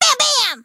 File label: hit; freak fortress 2; hat kid